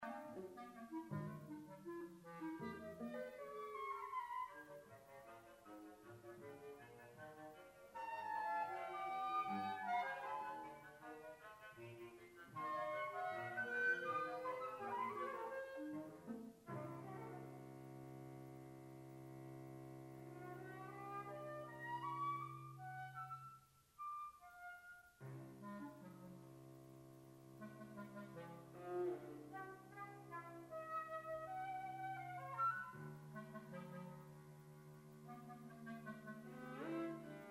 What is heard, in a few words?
Quartet Excerpt